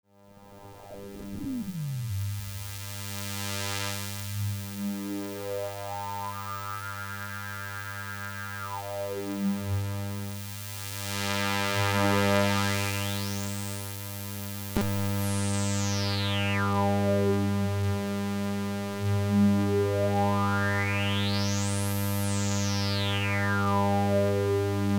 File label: PPG System 300